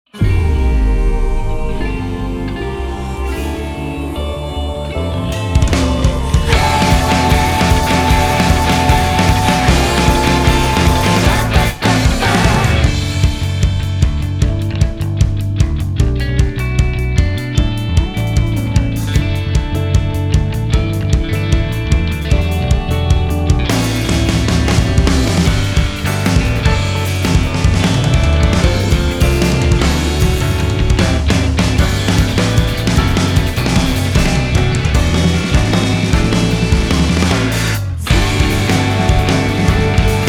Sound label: Theme Song Instrumental Version; eBASEBALL Pawafuru Puroyakyu 2020; Pawapuro 30th Anniversary Edition